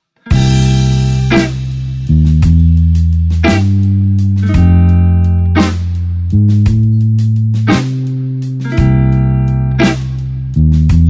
blues slow basic pattern C

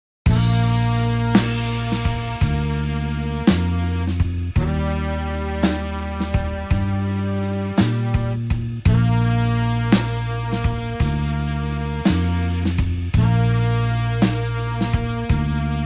blues horns slow Bb

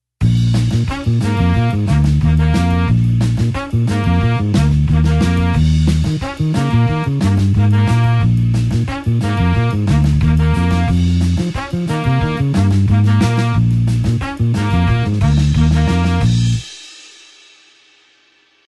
blues horns 8beat Bb

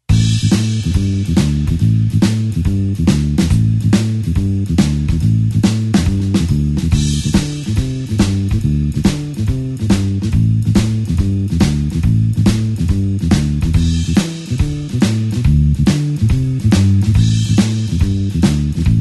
blues bassline shuffle A

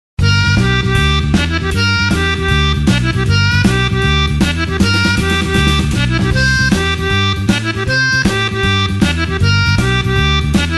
blues harp shuffle G

